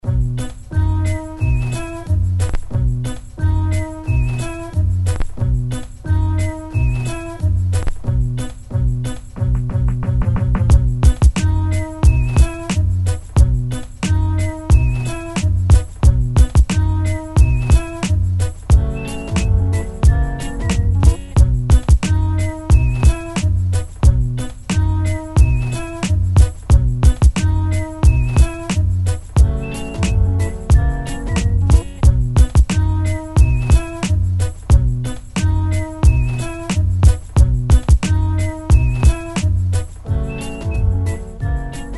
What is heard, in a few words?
Beats